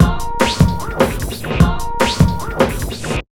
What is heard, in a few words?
150, Big Beat Drumloops; USB Soundscan vol.25; AKAI